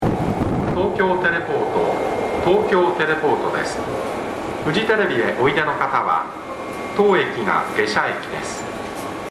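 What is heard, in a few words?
tokyo teleport 1 coupling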